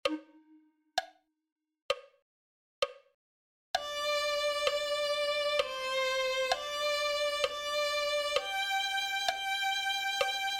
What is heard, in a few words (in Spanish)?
en el dia del mal violin